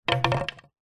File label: foley stick small drop on concrete ground; zapsplat